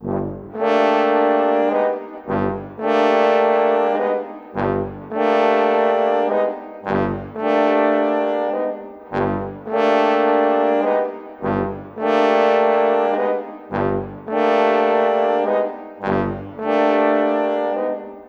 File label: Brass, Cinematic; Sonic Foundry (Sony Creative Software); Orchestral Series VOL-3; Cinematic 11